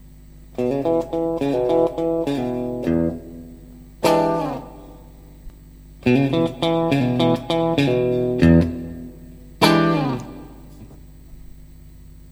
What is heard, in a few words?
tech21 flyrig v2 blonde